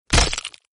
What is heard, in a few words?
attack hit; sprunki simon evolution pack